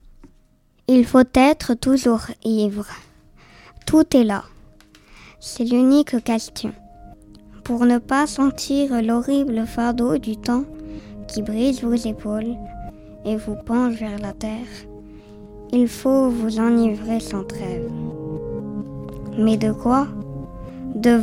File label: piano reverse